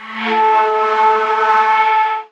ethno, sound ethno

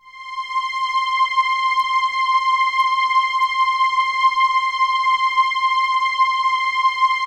STRIN, SYNTH, Trance; AMG - Global Trance Mission VOL-2

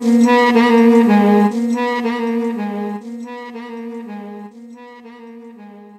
Sax Delay

